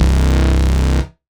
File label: Bass C, techno synth shot; Ghosthack; Techno Synth Shots 2025